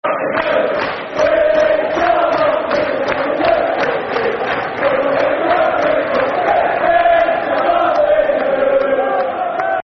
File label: We Shall Not Be Moved, Football Chants (Soccer Songs); Liverpool Lfc